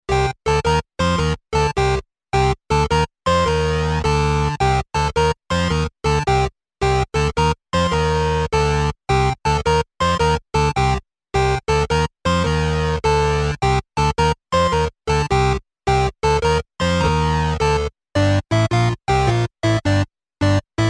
Game Alternating Walking Loop

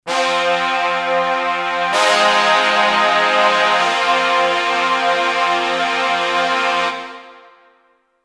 Duo Fanfare Jingle

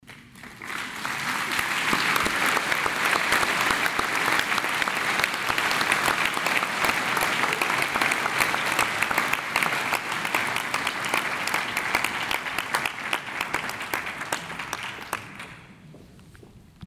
Applause

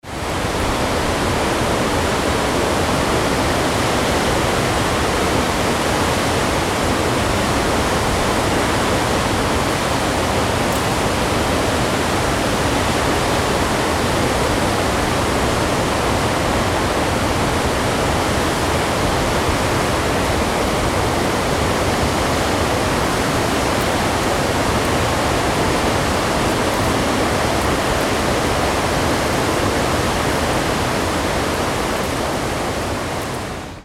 Gulfoss

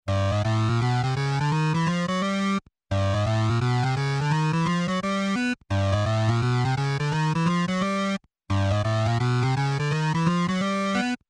Happy Forward Theme, Game

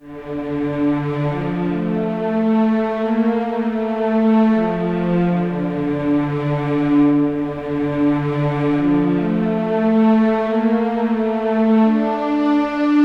Stringset Hall

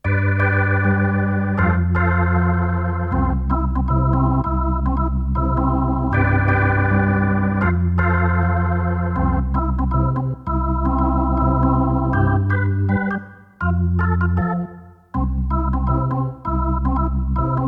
Funny Talk Retro Organ

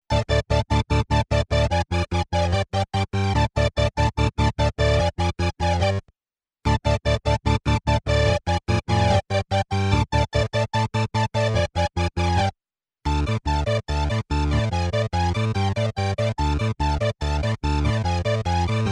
Danger Theme, Game

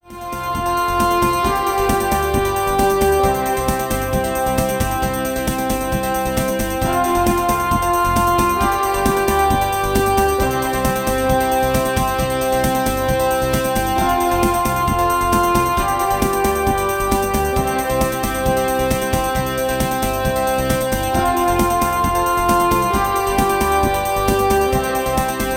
Hornblower Jingle

